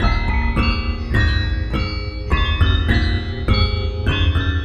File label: Oriental Delay; Sonic Foundry (Sony Creative Software); Ma Ja Le Ethereal Textures